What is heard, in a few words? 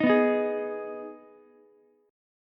Ab Minor Ab down Ukulele; Abm strummed; JGuitar